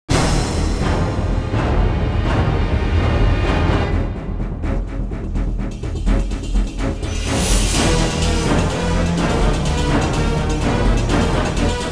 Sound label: action; X2 Wolverine's Revenge; XBOX